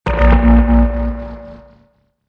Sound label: farty a sharp hit, Basses